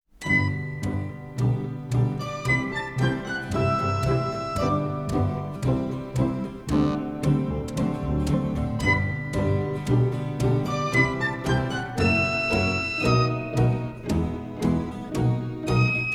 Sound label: Samba; cinevox